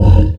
MONSTER Grunt Short

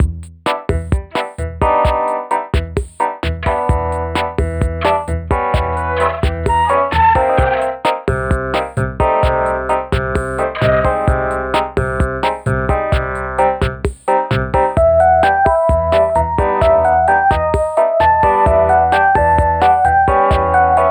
Elevator music; LEGO The Incredibles

